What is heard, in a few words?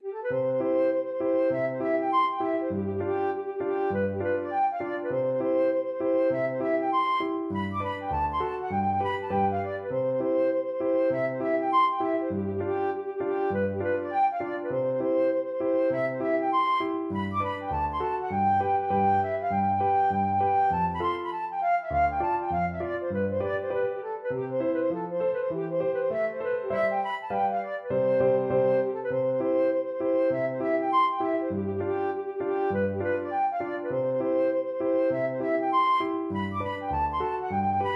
trumpet hornpipe, flute